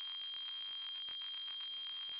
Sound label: piiiiii